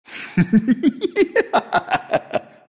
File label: laugh; dota; stormspirit